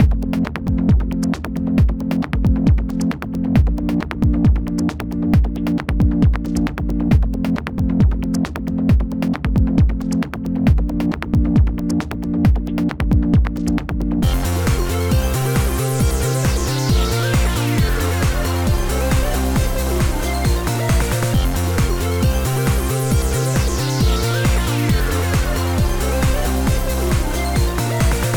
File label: Ask Countdown Music; Jackbox Games; The Jackbox Party Pack 1; YDKJ